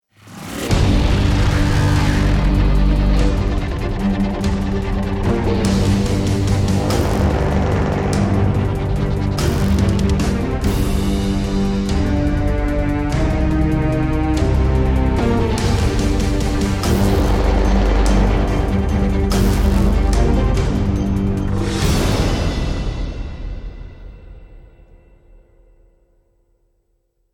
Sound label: start round, music; Counter Strike; CS2